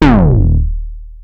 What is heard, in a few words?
Synth oneshot Short Slide Eb, Synth Bass One Shots; Slate Digital BASSHOLE Sample Pack